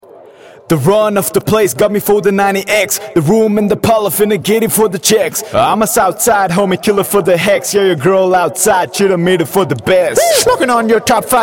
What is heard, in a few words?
acapella, HH 100 FM